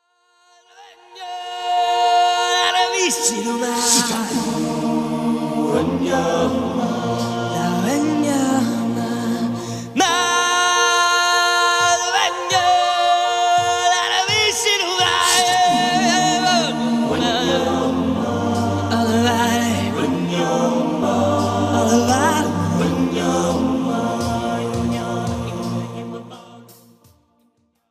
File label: Theme Ringtone; Lion King